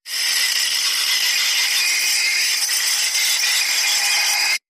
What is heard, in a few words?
Cold Skin Scraping Reverse